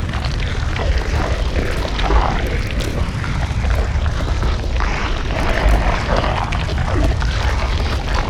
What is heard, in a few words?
Tongue Grab Aim; Evolve; Behemoth